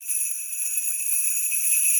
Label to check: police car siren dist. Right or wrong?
Wrong